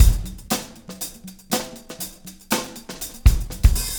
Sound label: Motown, R&B Drums; Legacy; Drummers of Motown